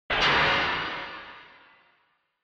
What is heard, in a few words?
Retro Anime Mech Equip